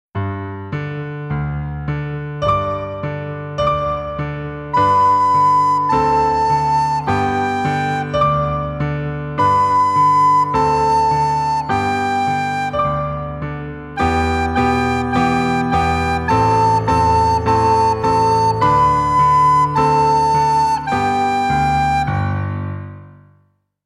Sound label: hot cross buns full performance